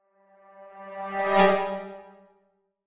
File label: Horror Musical Brass Woodwind Crescendo Sharp; Blastwave